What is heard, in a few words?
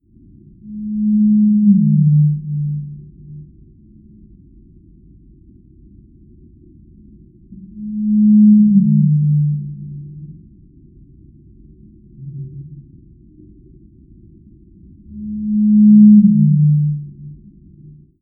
blue whale z call; brian miller australian antarctic division